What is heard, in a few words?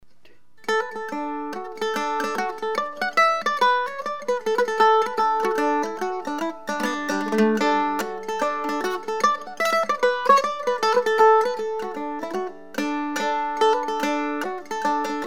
Hornpipe, custom mandolin; Peter Coombe Mandolins and Guitars